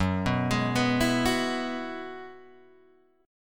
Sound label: F sharp 7th Suspended 2nd Suspended 4th F sharp down Guitar Open G